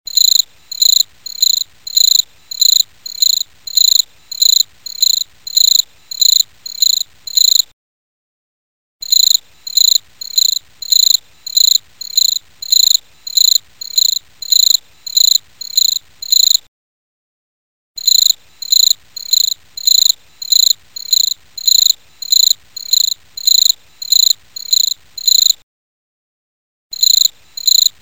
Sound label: midnight cricket